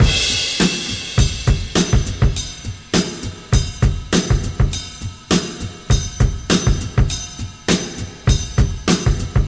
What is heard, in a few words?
101 bpm fresh rock breakbeat c key